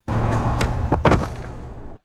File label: heavy concrete barrier dropping onto soft dirt